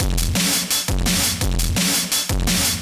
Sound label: Beat Digi 170, distorted drum loops 170bpm; musicradar